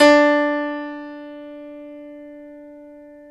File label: PNO, Grand Pno, Roland; Club-50 - Foundations; Grand Pno 1